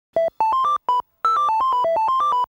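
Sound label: for sms sms version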